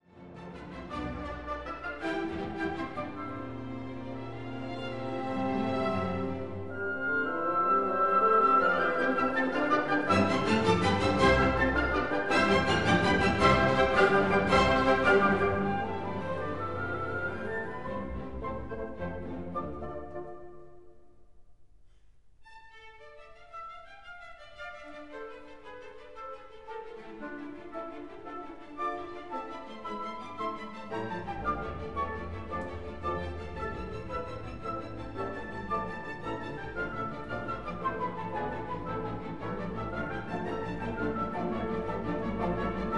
Symphony No. 9 in D Minor Choral II. Molto vivace Presto; Beethoven; Op. 125